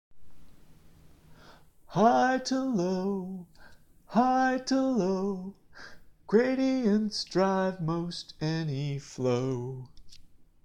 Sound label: jingle; STEM Songs